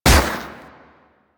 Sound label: Akimbo Pistol Shot; Grineer